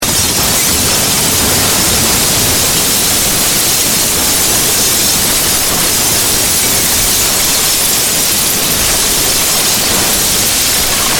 Crash Mass Destroy, Crash, Mass, Destruction, Wood, Glass; Debris; Materials; BlastWave